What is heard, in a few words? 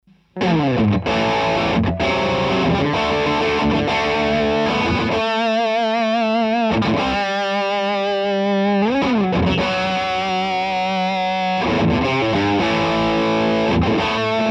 Gain Solo